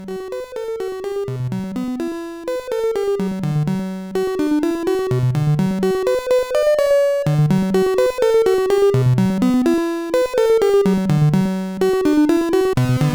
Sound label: intro, sid CSG8580R5